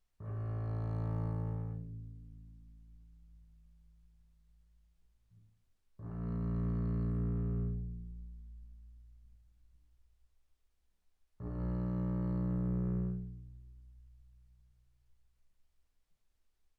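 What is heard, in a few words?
Bass arco sul A mezzo forte A1 B1, double bass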